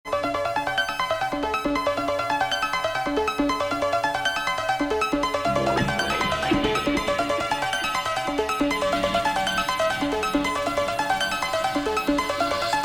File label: Arpeggio